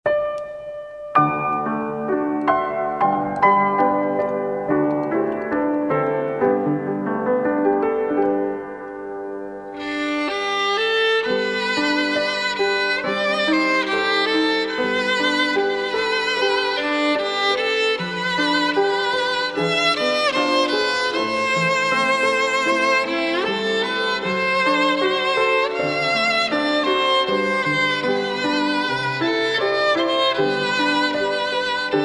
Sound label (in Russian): музыка